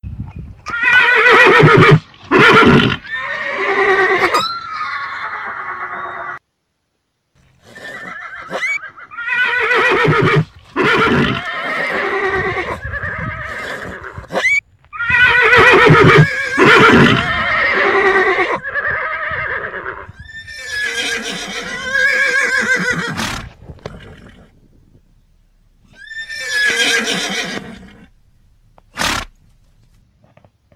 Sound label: horse